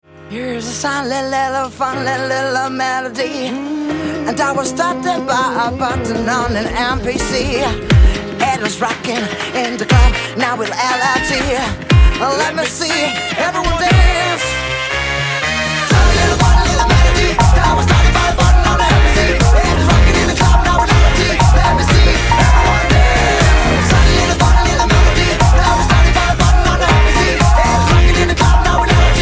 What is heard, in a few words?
Swing